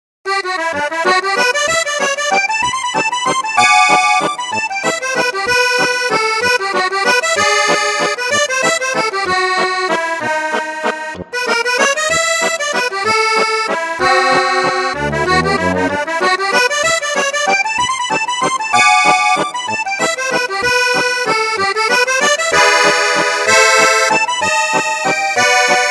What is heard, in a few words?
Accordion Bal Musette Musette Accordion; Akkordica; Syntheway